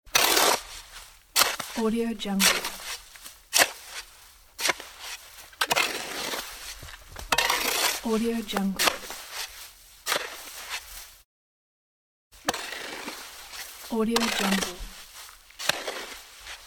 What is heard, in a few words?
cement mixing sounds